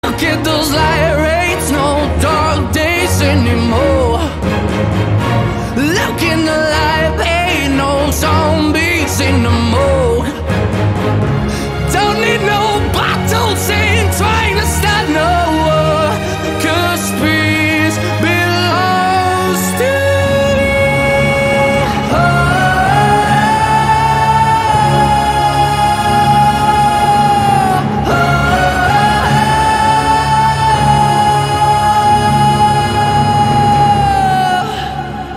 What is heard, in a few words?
silverlines orchestral version